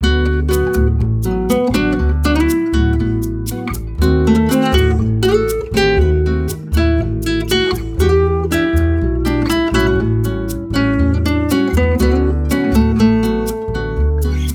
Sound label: Bossa Nova